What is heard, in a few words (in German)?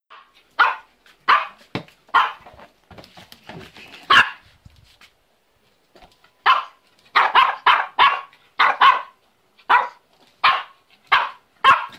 Hund; hundehuette